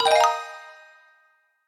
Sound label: correct question sound; khan academy